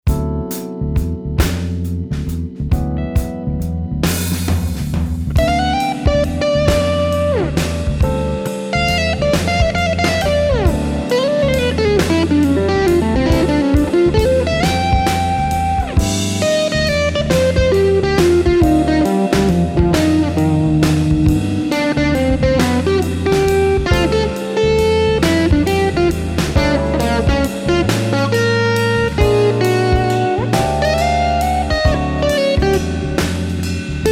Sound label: improvising mix